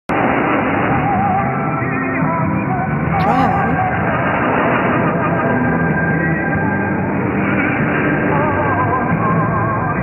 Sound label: 6003 noise; eoh